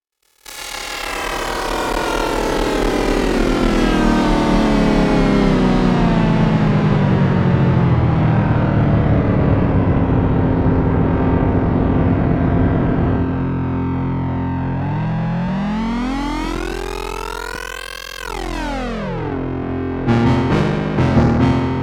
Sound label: FM LFO